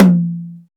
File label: roomy tom drum f key; tuned tom samples